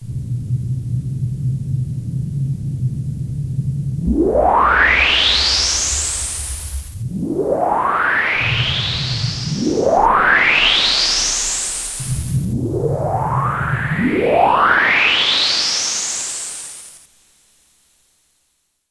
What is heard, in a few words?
ROCKET NOISE, Korg DW-8000; Factory Patches